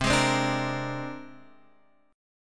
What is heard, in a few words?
C 7th Suspended 2nd Sharp 5th, chord; C7sus2#5 strummed; JGuitar